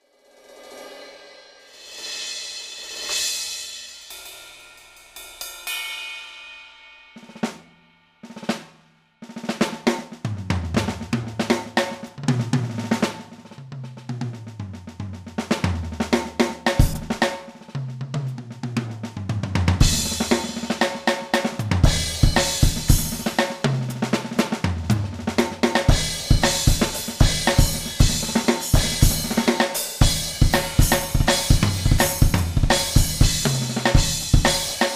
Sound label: drums